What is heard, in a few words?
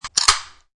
Pulling tab on soda can; Drink Mixing; Bars